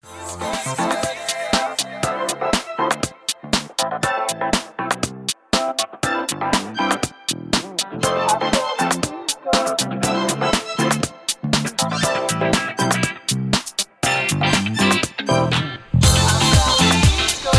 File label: Disco; 80 Days; Windows